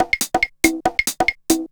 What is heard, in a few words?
TECHNO PERCUSSION 140